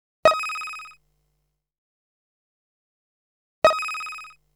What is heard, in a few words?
Sound Effect Bullseye, string quartet